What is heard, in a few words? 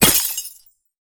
ice spell impact shatter